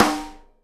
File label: SNARE RIM, Live Snares; PlatinumPhattCD1; E-MU Producer Series Vol. 8 – Platinum Phatt